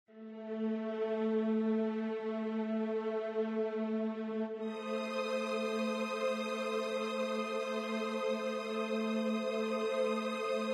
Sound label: music; source engine; tf